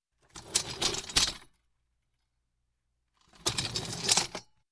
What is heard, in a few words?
Office, Chair Rolling On Wood Floor; Sound Ideas; Series 2000